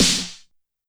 Snare Ana Gate, YAMAHA RM1X

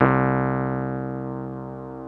Keyboards Old School, C1; USB Soundscan vol.09; AKAI

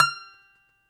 DOMRA, Russian Strings

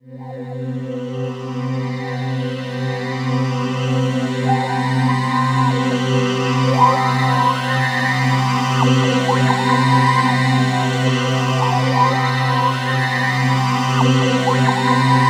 beauty, ethereal atmosphere; USB Soundscan vol.13; AKAI

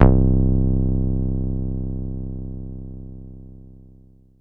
303-Style single hits

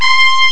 trumpet; spy; Amiga Soundtracker Sample Packs

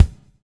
BUBBLE; Spectrasonics - Backbeat

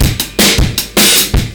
Look Good, 155, Beat Loops; Zero-G; Monster Beats

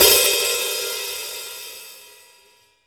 HH OP, Drum Dry; East Collexion; Drum 1

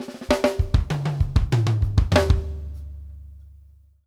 OFF TOMS, DRUMWORKS FILLS 96

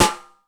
DRY S, drum; Crypton; DrumBase5000

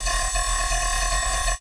Evil Frequencies, bass

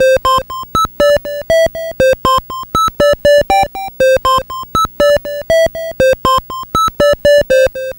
Atari 2600 Synth FX; 8-Bit; Chiptune

